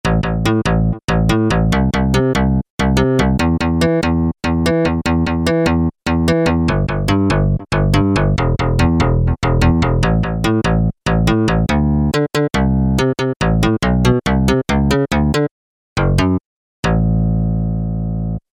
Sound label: FM, Peavey DPM Spectrum Bass